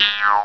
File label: walk; baldies